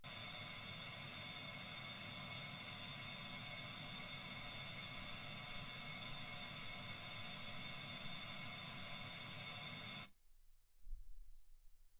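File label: white gaussian noise 48kHz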